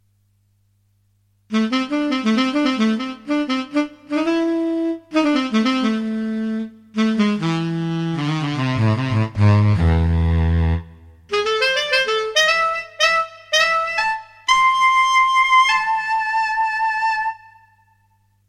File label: Bright Breathy Saxophone; The Netherlands